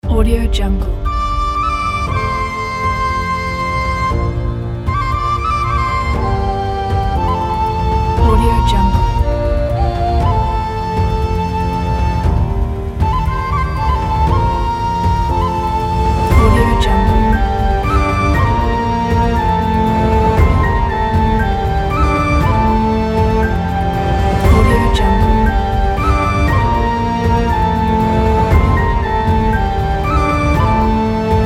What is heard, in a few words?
viking